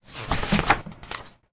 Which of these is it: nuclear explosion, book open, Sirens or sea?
book open